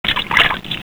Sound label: water; DesertTrek